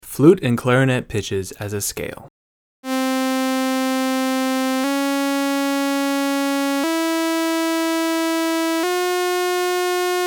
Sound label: fl scale, flute; flute clarinet